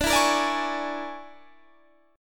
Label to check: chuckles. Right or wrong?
Wrong